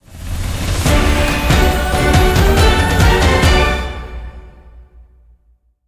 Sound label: End Level Victory; Asterix & Obelix Mission Babylon; PS5 Switch Windows Xbox Series X/S